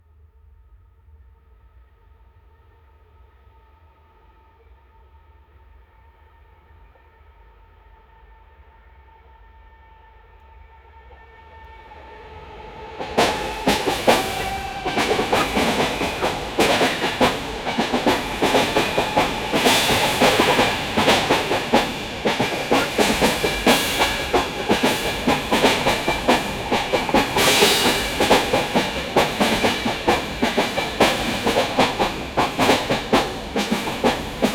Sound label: uk train pov extend; 1970s